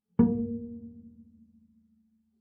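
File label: Bass pizzicato fortissimo sul D Bb3, Double Bass; MIS Pitches - 2014; Strings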